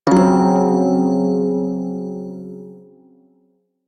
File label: Prime Shawzin Hirajoshi Chord B; Music; Warframe